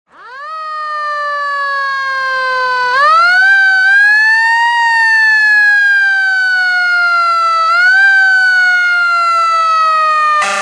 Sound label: fire department rescue truck sirens hi lo wail yelp; spring bay; horns